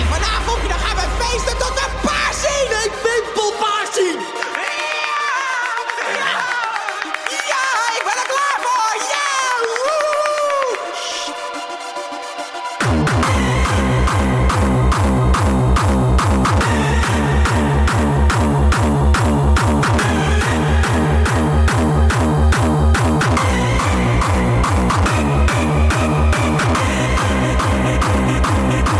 hardstyle; spongebob

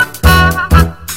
Gmail Tone

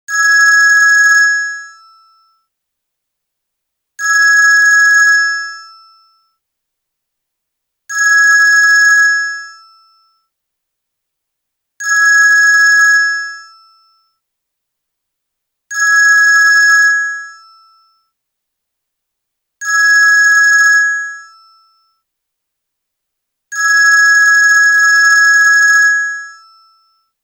old ring

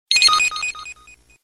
Mail Sent; MGS4

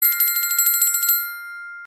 Little ring bells

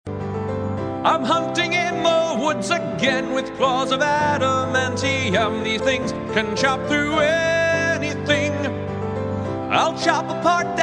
Musical; Wolverine

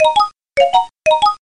Twinkles

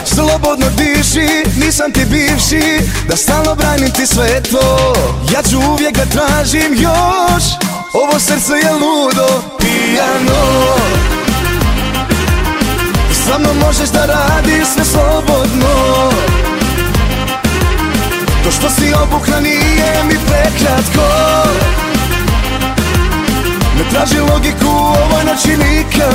pijano

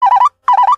alert tone

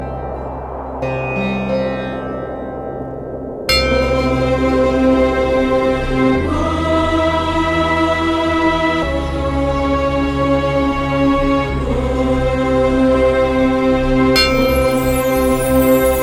soothing morning

